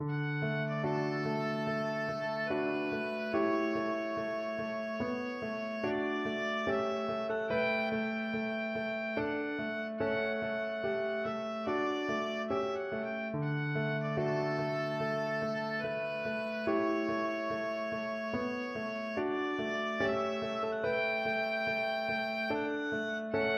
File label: raindrop, oboe